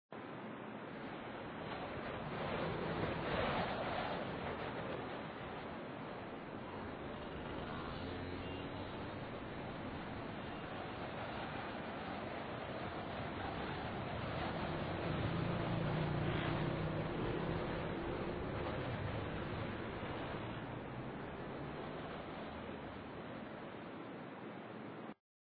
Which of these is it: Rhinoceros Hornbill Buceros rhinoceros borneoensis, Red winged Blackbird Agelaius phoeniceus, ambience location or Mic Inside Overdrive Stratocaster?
ambience location